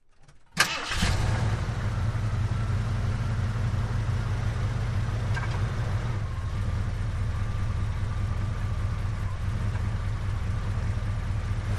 Wagoneer: Start, Idle And Fast Away, Jeep Wagoneer